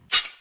Trap

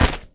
Sand Impact Bullet

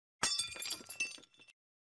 Cup Break

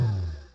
Minigun Stop

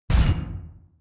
pulse

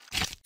Fruit Impact

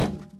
Plastic Box Impact Hard

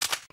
Weapon Impact Soft

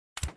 CrossBow

